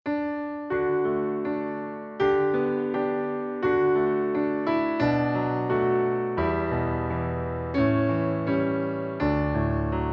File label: Piano Offertory Solo